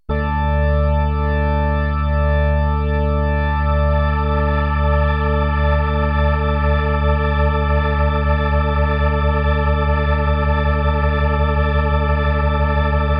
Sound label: Leslie Cabinet Slow Fast Slow